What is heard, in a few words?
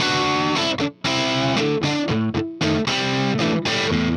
Riff Guitar, Indie Rock